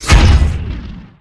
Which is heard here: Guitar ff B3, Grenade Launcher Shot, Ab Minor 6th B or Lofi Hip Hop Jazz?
Grenade Launcher Shot